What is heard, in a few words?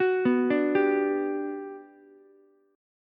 G Major 7th, Ukulele; GM7 arpeggiated